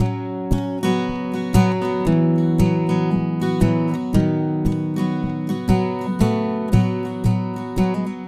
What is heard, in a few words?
Acoustic Guitar; Guitars